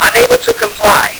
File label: LSDj, Nintendo Gameboy; 300 Drum Machines